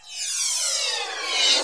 WHOOSHES; AMG Megabass REMIX! VOL-2

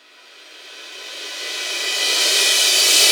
Reverse Cymbal

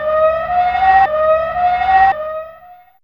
Saw, Fairlight CMI IIe

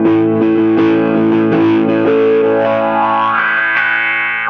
Wah Wah Gtr

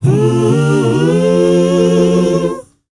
mm choir, S. African world voices; ILIO; Vocal Planet

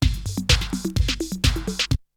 Drumloop; ZERO G - Datafile DISC 3

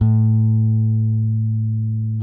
Whole Notes A, Bass; Bass X

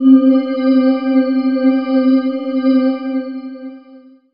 pad c, Chillout ambient Synth Pads

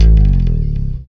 BS CHPSTK, Chapman Stick; Roland L-CD701

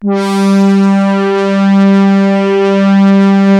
Polymoog G3; Greytsounds VOL-1

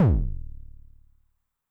BD MOD [Pitch+Depth+Overdrive], 909mod, BASS DRUM

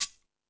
FX Sounds, Drum Machines E-MU Pro-Cussion

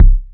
Bass Drum, Cwejman S1 MK2

